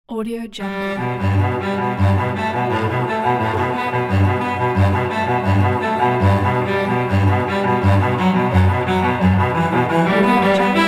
virtuoso cello; orchestralis

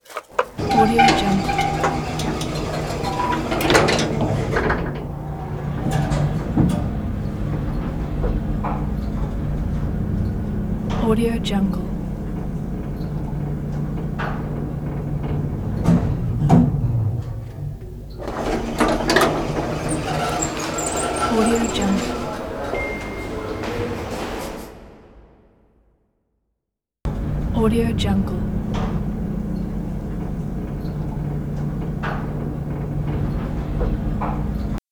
old hotel elevator inside; soundcreator

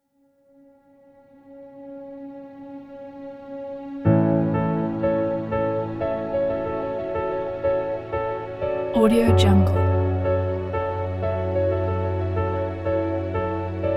heartwarming story

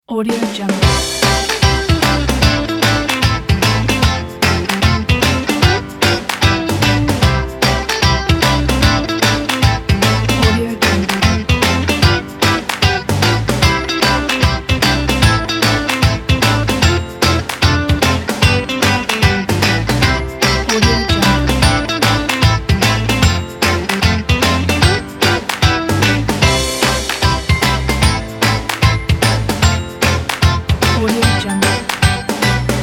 sunny positive happy background

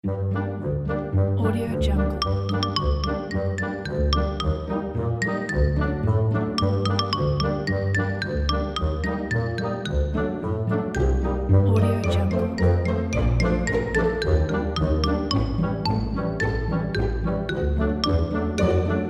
cartoon adventures

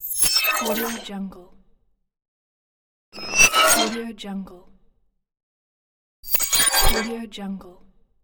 glitch transition

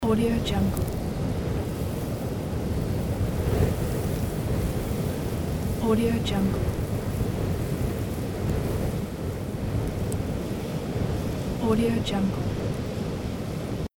wind with sand loop

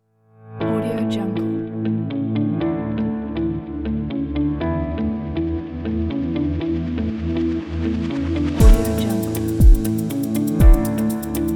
corporate spirit

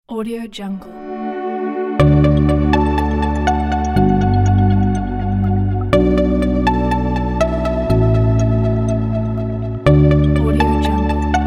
trendy corporate

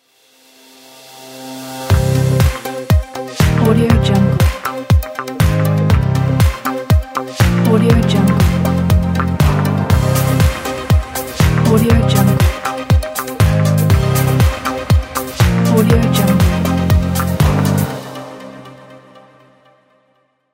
pop dance logo; yabudingo

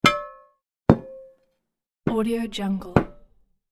dishware silverware on table; hollywoodedge

